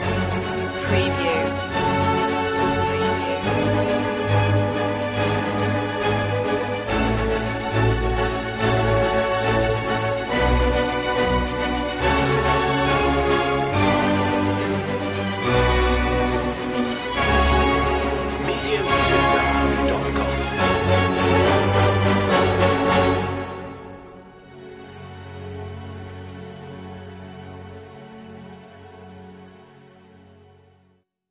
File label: no choir no perc